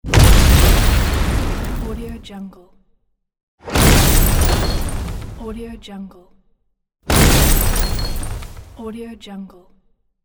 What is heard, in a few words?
car explosion; game sounds